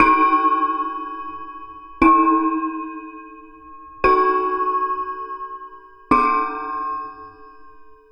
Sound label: Shunt, Ambient Metals; Sonic Foundry (Sony Creative Software) - Ambient Atmospheres & Rhythms